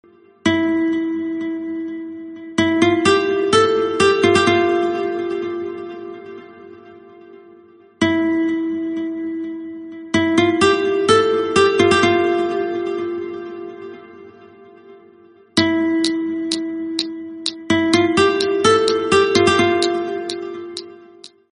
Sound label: samsung galaxy s9 guitar ringtone